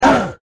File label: pain